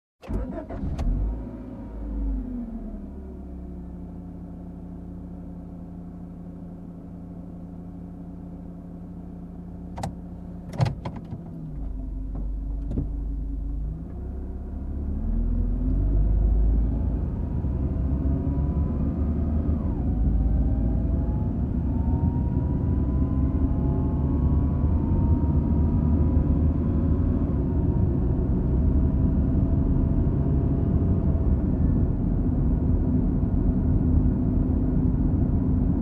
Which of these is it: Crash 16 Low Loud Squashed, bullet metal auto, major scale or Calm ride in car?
Calm ride in car